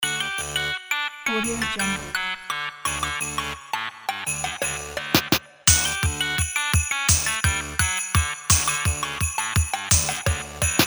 arcade christmas 8bit intro

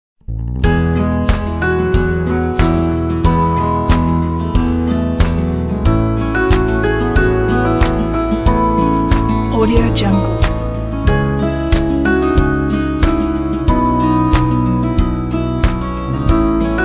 corporate inspirations soft background

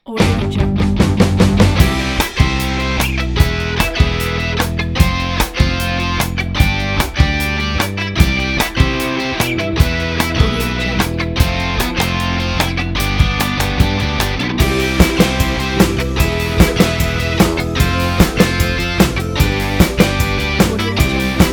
indie rock ident; crystal logo studio

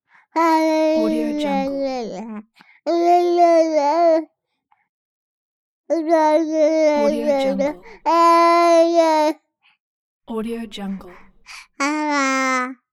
babies boy

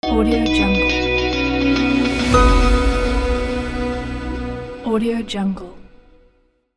company logo

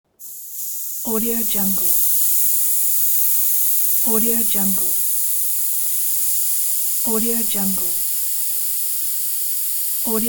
air outlet

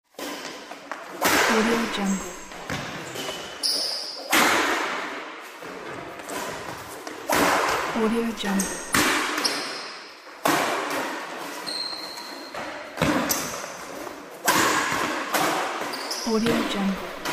badminton

